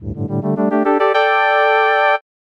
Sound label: big enemy encounter; mother3